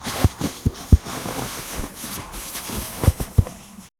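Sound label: extremely slow fabric rustle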